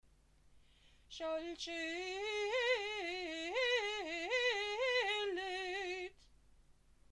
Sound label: shalshelet; Torah